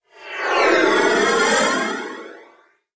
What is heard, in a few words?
Hollow Tube Sweep